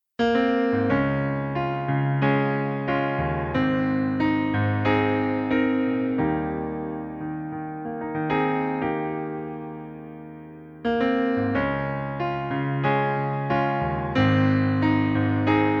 Pianoplayback Eb-Dur

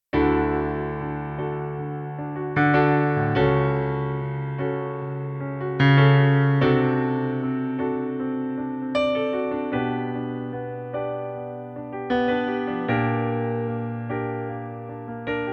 Pianoplayback D-Dur

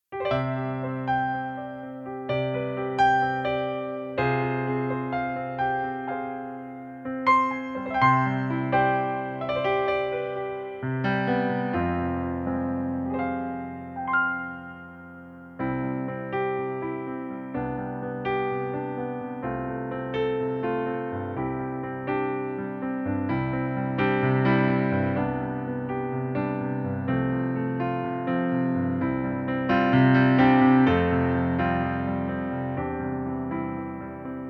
Pianoplayback in C-Dur

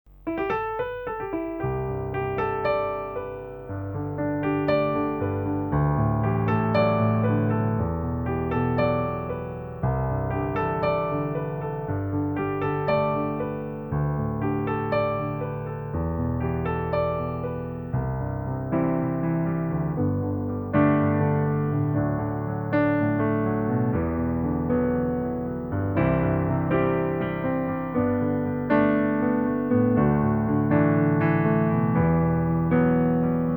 Pianoplayback frauenstimme G-Dur

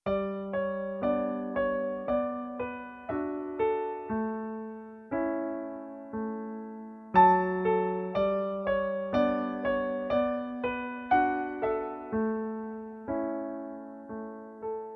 Pianoplayback in D-Dur

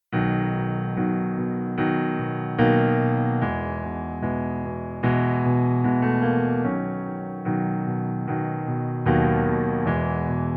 Pianoplayback H-Dur

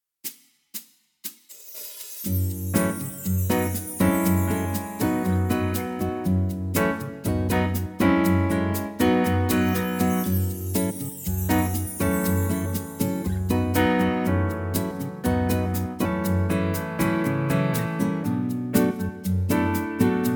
vollinstrumentiertes Playback BossaNova F#-moll

